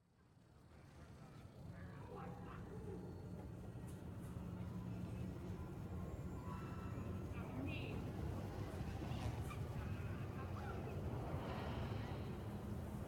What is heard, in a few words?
amb city; ambiance; environment track; tatooine